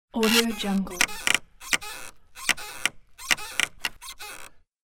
wooden rhytmic creaking; domestic; domestic sounds

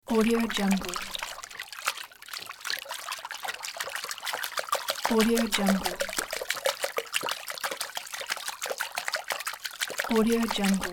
water moving lapping; ضربه به سطح آب

